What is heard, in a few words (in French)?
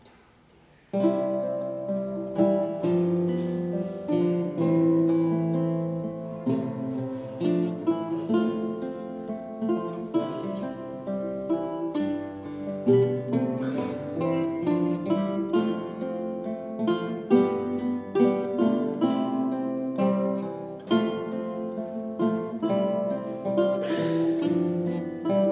Guitares Petits